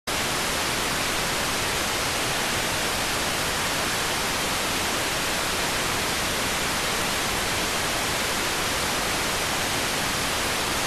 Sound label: rtty rx